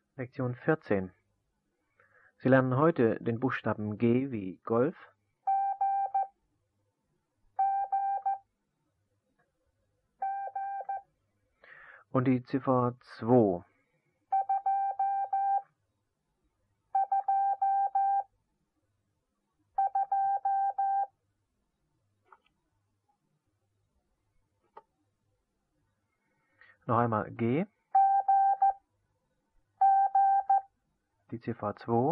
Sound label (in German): Morsen; Onlinelehrgang